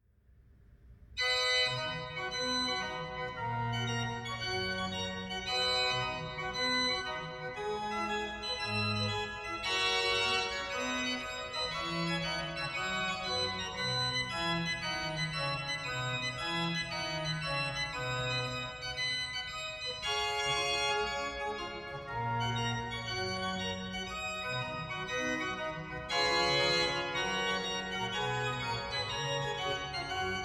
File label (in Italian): Preludio E Fuga In Do Maggiore